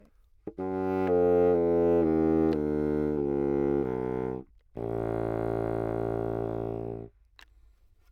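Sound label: low chromatic, anechoic classical bassoon; OPENAIR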